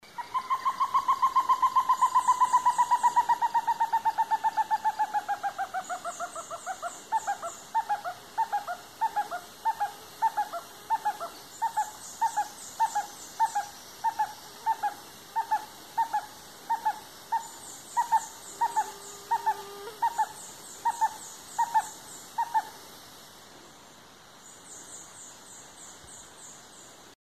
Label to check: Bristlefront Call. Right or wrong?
Right